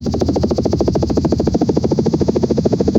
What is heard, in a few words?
helicopter